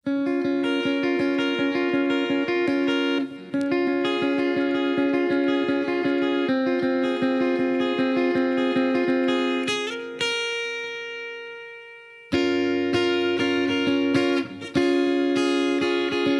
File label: guitar vocal